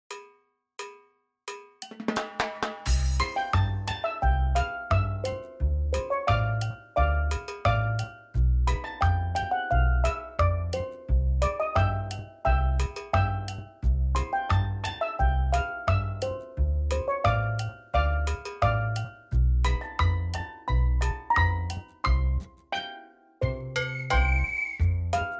ragtime

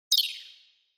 Cyber

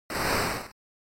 Noise Phrase, NES; NES-General

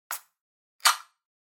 Beverage Can Pull Tab

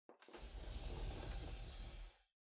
Air Purifier Far Move

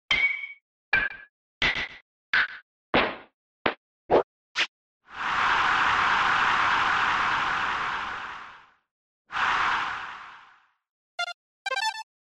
SNES Baseball

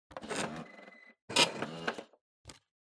Time Recorder Print

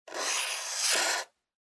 Felt Tip Pen Circle

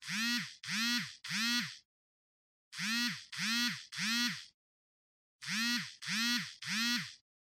Cell Phone Vibration Hand

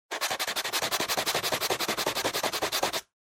Eraser Rub

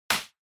Party Popper Close

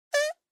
Poo Poo Cushion

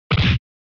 SNES Fighting Hit

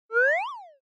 Motion Pop

